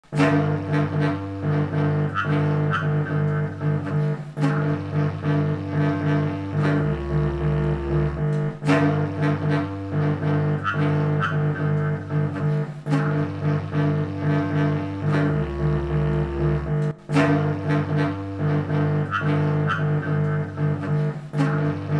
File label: Improvisation